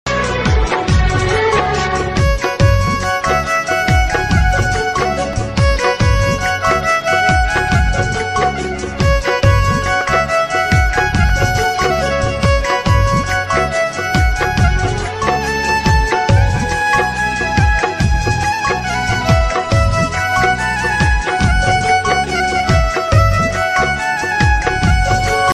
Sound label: instrumental ringtone, piano ringtone, old is gold, sad instrumental ringtone